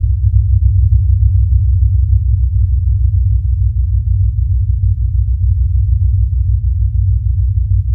SUB WAVE, Special FX Twisted

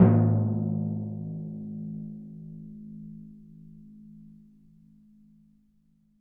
TMP A#, Synclavier Percussion; ILIO; Synclavier Percussion 2; World & Orchestral

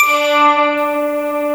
CLOUD 9 G3, M-1; Greytsounds VOL-1

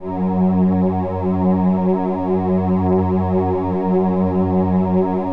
LAMBDA, String Machines

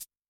Cabasa; Yamaha DTXpress-II; Perc

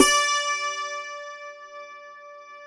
DULCIMER D5; SoundBytes; Early Patches

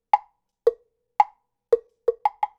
Temple Block, Latin Percussion

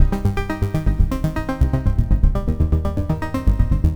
NRG Arp, trance

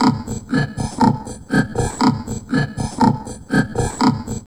VOCOD, Drum Loops Crazy Processed; USB Soundscan vol.07; AKAI

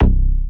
SNGL.BASS, Jungle Rave

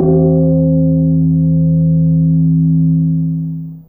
Chimes C2, Fairlight CMI IIe, Mallets

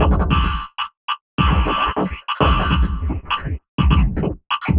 perc 100, Percussive Loops, 100 bpm; chaggachag

